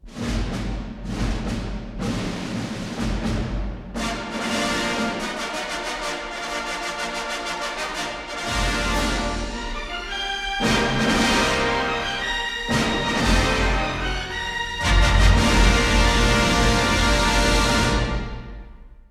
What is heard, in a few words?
Fox Fanfare, flute